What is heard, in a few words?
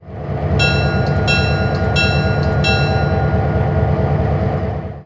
Bell Brass; RailPro